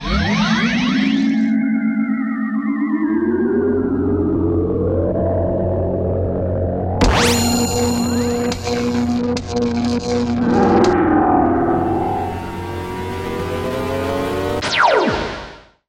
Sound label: Ufo; Touristtrap; Burnout CRASH!; Xbox 360